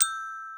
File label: gloken